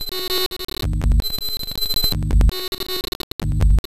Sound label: buffer fuct loops